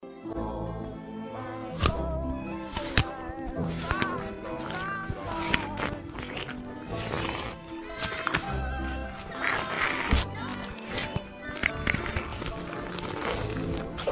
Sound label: Watermelon ASMR